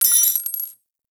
Belt Fed Rifle Links; Warframe; Tenno; Frumentarius

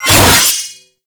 Drill Scythe Whoosh; Warframe; Grn Drill Scythe

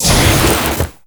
Teleport Start; Warframe; Stalker

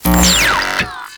Screen Shutdown; Warframe; The New War; Cephalon Tweacher

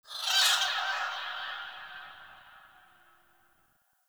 Forest Bird High, Ambience; Warframe; Grineer

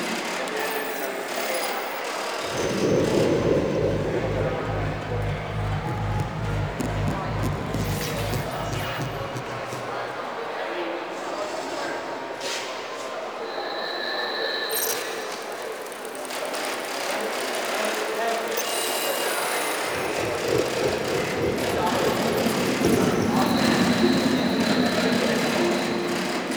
Hub Concourse Fragment Amb Loop; Warframe; Ambience; Tenno Hub Concourse Fragment B

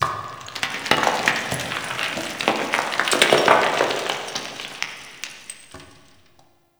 Cave Rock Slide; Ambience; Warframe

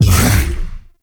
Bowl Jump C; Warframe; Grendel

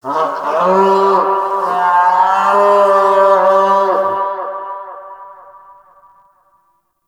Lure Call Success; Warframe; Predasite; Hunting Critters; Characters

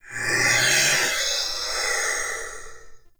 Binaural Whispers; Ambience; Warframe; Shadowgrapher

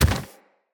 Root Spider Mother Step Dirt

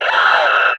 Enemy Pain; Warframe; Lasrian; Battle Barks

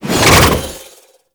Archwing Prime Sword Short Whoosh; Warframe

